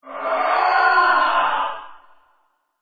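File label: skeleton death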